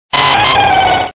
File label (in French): Cri